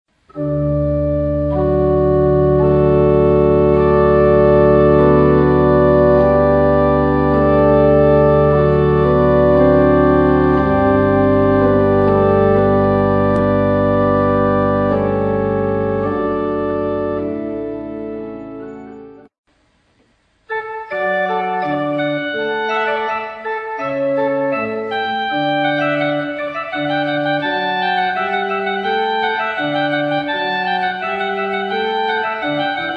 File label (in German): Trumpet Vol in D Dur; Bennett